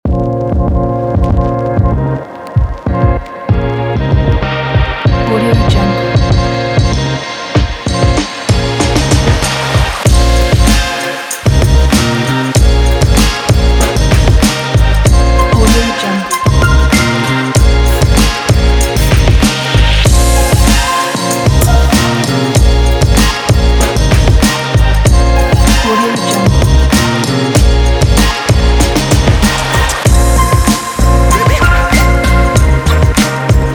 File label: hip hop